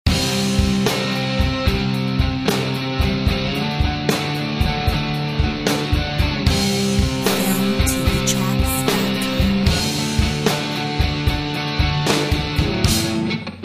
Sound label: Muddy blues; Filmtv-tracks